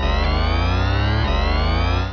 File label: Siren; fmj; arcade